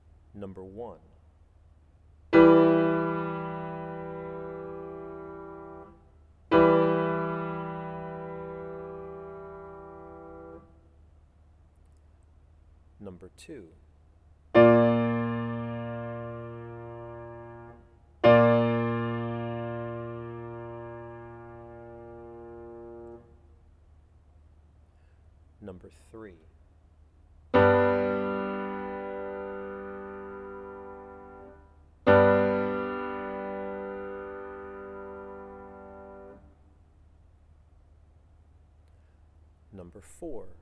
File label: chords; eartraining